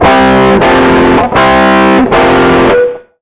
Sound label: 160 H ROCK, Electric & Acoustic Guitar Loops; USB Soundscan vol.04; AKAI